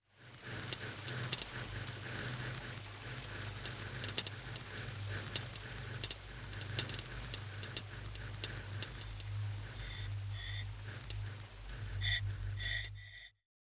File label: time clicks fire